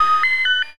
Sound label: bird large